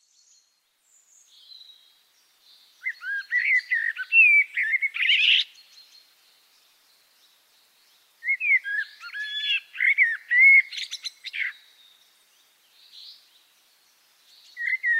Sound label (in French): merle noir